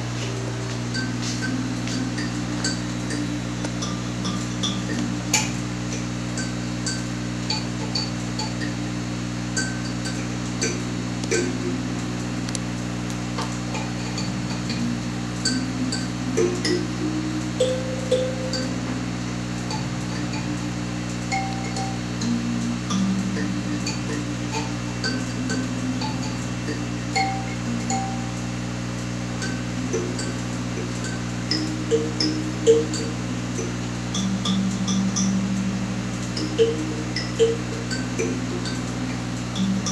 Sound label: calimba